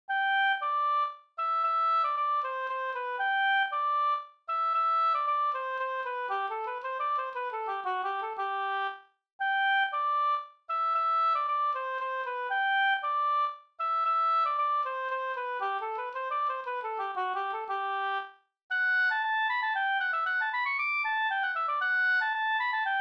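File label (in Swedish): polska Gokpolska Södermanland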